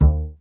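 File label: STR CBS, Solo Instruments, double bass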